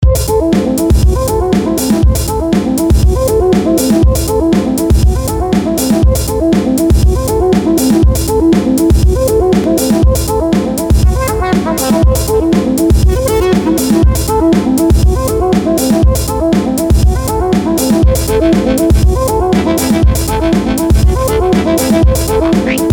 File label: tune of deep bass